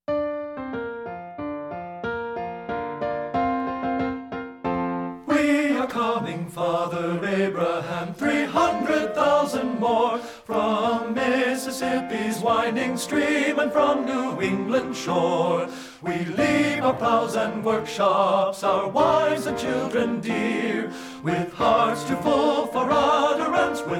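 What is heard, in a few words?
WE ARE COMING FATHER ABRAHAM TENOR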